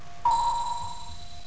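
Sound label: echo sounder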